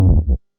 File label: guess miss